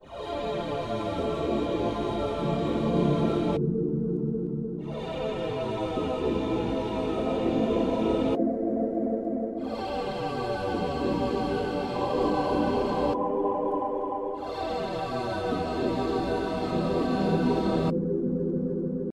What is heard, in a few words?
Long Hallway A Ambient